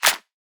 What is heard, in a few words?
House Clap; Drums; One Shots; Claps